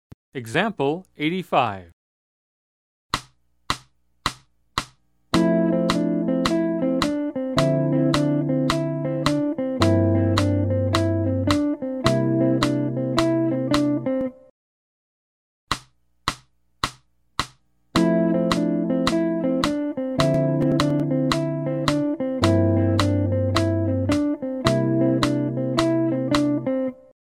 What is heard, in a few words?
Jazz; jue shi ji ta